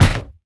Smack

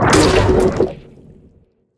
Giant Attack

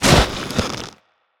damage

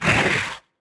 Crawler Attack

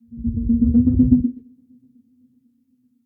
MOLOCH attack

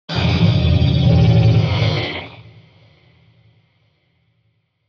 death; CARRIER

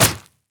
Limb Slash

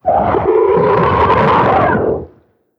TIGER TRESHER death

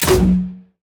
Grenade Launcher Shot

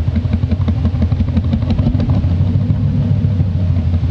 Pump Track

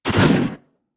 MUDRAPTOR attack

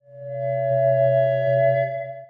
MOLOCH attack

